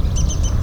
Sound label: High pitched hee hee hee call notes of a Grey breasted Prinia; Shivam Chitnis and AK